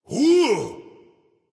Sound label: surprise; smite; voicepack; raijin